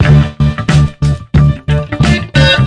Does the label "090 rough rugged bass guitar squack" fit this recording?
Yes